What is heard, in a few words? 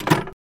Drivers Hatch Close; doors metal